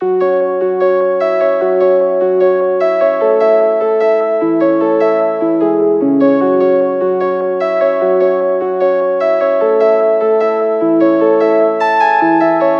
Melody F# 150, Color Bass Essentials, Melodic Loops; NyteTyme; Ghosthack - Color Bass Essentials